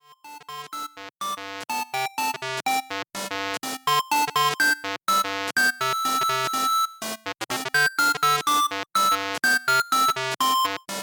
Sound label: bumpy road gameboy